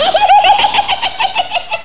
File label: laugh